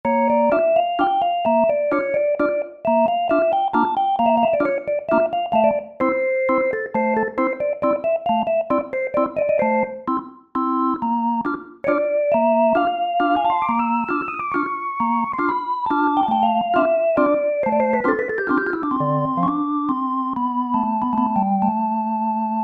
Farf 8' + 2', Roland SRX-07 Ultimate Keys

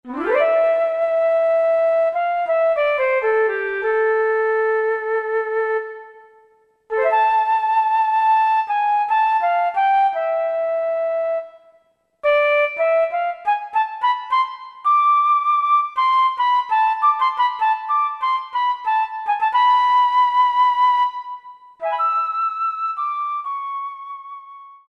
WN Flute, Yamaha TG77; Factory Presets

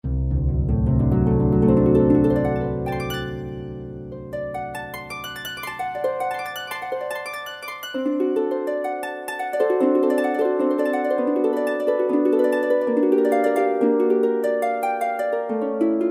Dynamic Harp; Kurzweil K2000 Orchestral ROM

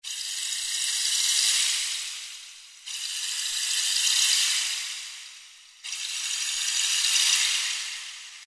Rain Stick; World; Roland SR-JV80-05

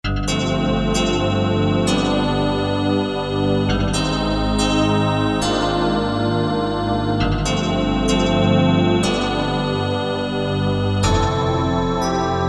D50&EP No.2; Korg DSS-1; ''D''-Synth Sounds

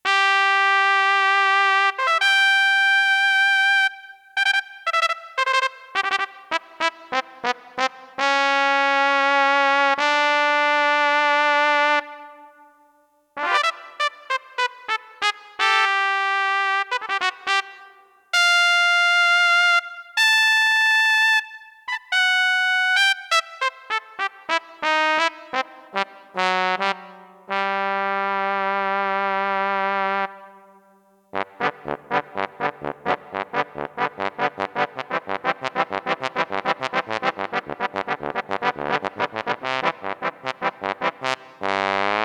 TRUMPET, Roland JX-1; Factory presets

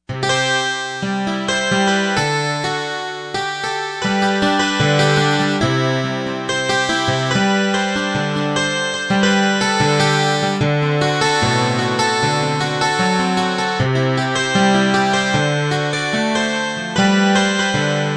12 String, Yamaha TG55; Factory Presets

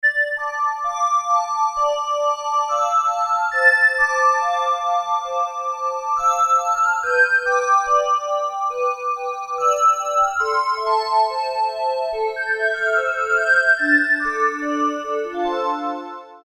SoftBell, Korg DSS-1 DWGS synthesizer presets; Korg DSS-1 Sound Library

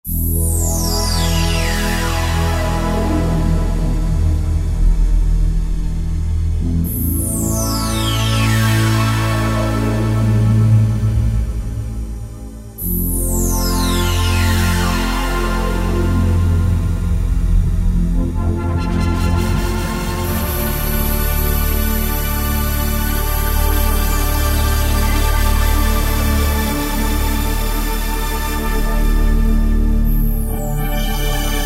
Roland JD-990; Thor!!!; Factory Patches examples

